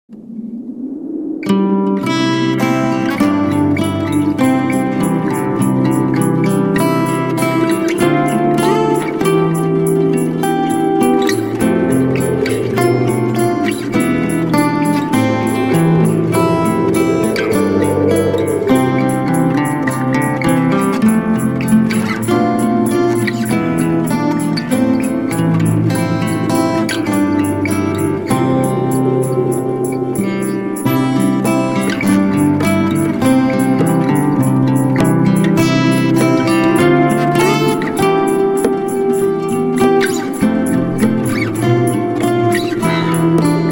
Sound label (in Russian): v lesu rodilasq yolochka acc guitar